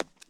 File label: concrete step; Golden Crayon Sounds